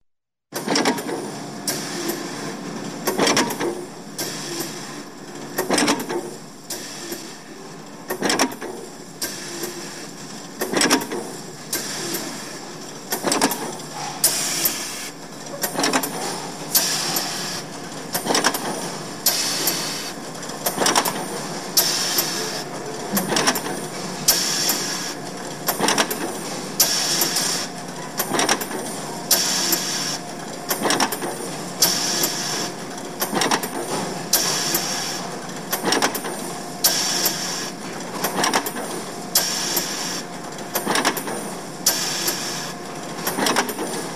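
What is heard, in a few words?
zvuk proizvodstvennoj linii na zavode konvejer, sound of a production line at a factory, conveyor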